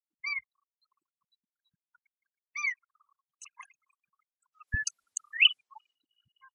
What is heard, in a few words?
bobwhite willet baby